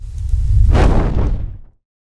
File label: cast fire; casting